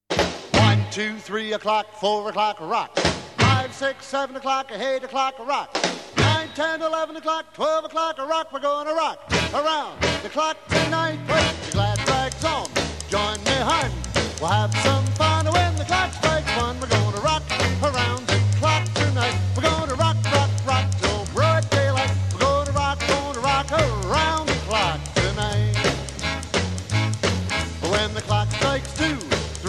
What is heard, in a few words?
rock n roll